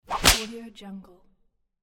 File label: whip